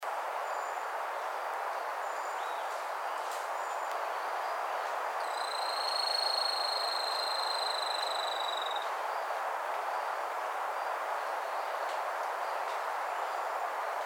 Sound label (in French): tamatia a col roux, chant